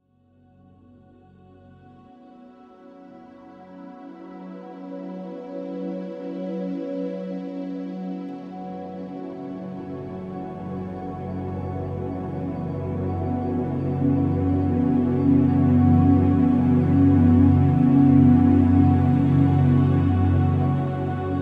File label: Ambient and Cinematic Soundscapes